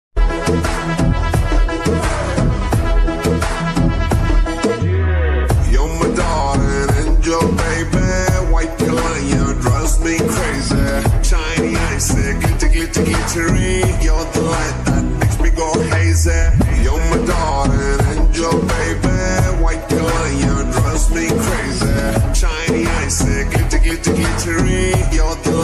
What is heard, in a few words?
slowed reverb ringtone